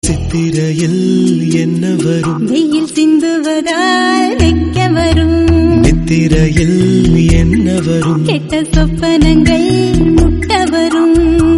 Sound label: thamil song